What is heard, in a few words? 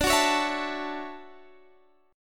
D Major 9th